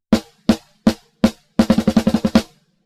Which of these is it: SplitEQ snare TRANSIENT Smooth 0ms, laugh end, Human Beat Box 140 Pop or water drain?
SplitEQ snare TRANSIENT Smooth 0ms